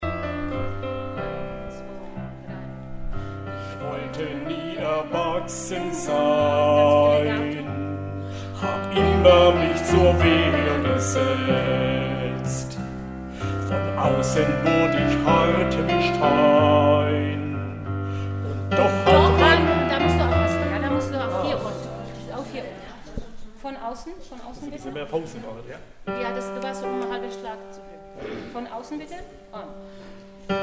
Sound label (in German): Solo Probe